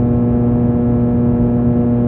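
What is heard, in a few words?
cabin hum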